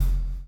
CHAIN; Spectrasonics - Backbeat

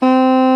SOP HRD C, SOPRNO SX

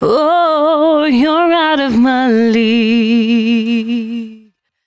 113bpm C#, Velvet Vocal; Zero-G

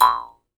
Jaw Harps

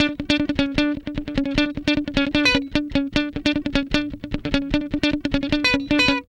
Guitar Licks

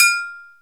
atarigane, Ethnic Percussion

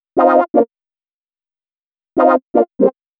120 synth licks C, Keys, Nu Jazz House; PowerFX

